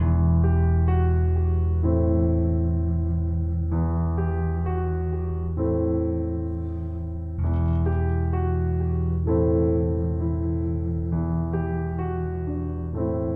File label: piano; pzserver